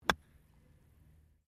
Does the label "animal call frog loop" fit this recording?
No